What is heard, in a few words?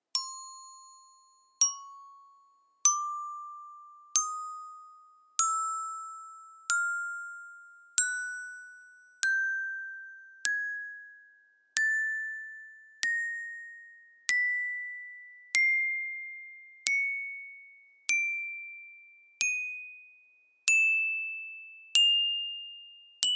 Glockenspiel using wooden club; Auris; AURIS KAH008 KAD012